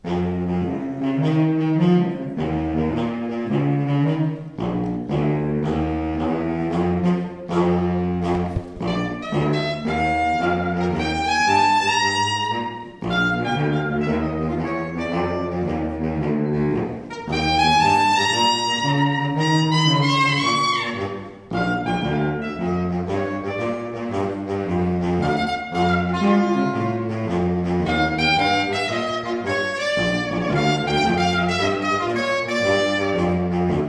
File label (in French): Basse Spranino